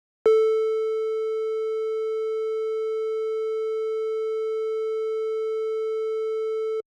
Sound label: Triangle